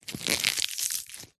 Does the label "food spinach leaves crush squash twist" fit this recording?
Yes